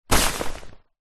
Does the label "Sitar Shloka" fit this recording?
No